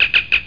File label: radio; warpath